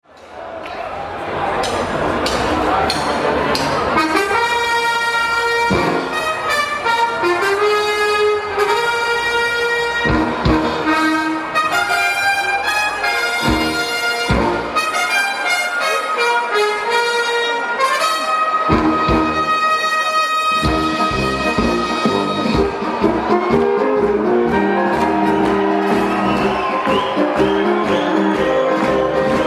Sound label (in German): Polka Remix im Festzelt, Blasmusik; Woodstock der Blasmusik